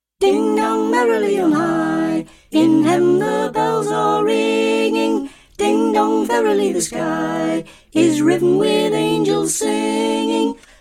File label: Soprano; Choir Harmonix; Harmonies; Carols; Ding Dong Merrily On High